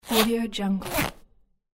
short zipper open and close

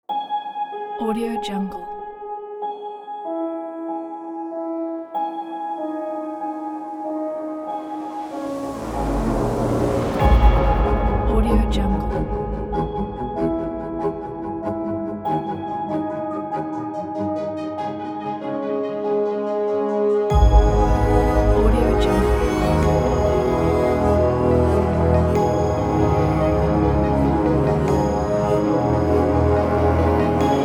airy dramatic introduction

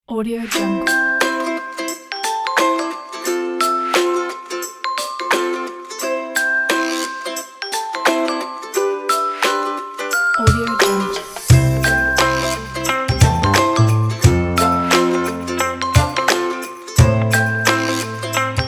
happy ukulele guitar; dailywaves

